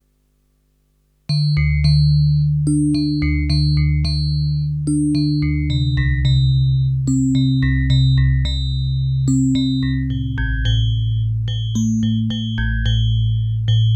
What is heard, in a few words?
dx7ii aurelium